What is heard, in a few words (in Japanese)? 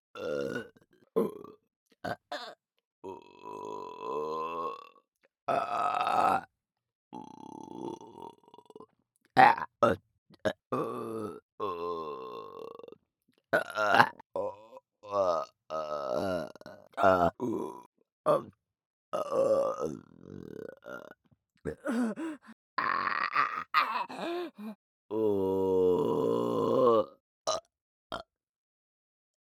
ゲップ切り抜き